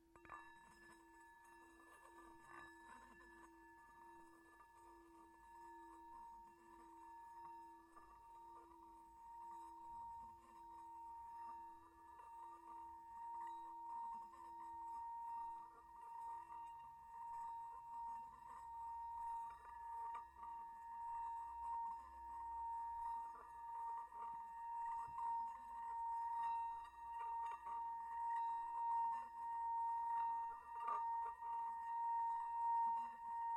Bowl Tibetan Resonate, Tibetan brass singing bowls; Sports